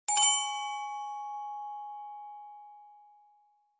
Sms Tone

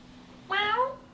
Meowing

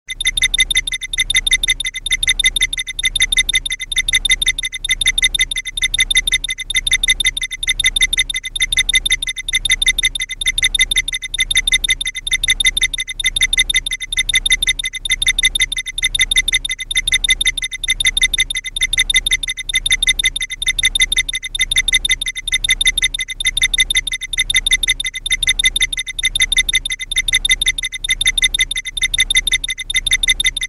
ominous beeping long

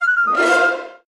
charge; darkprince

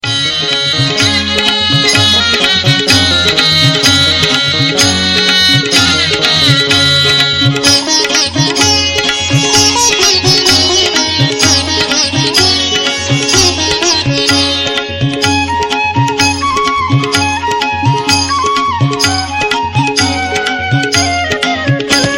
folk instrument, jazz

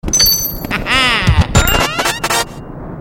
key; Luigis mansion

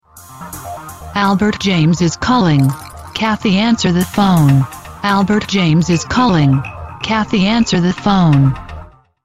Acoustic

